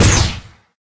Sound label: lasergun fire, weapons